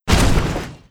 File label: land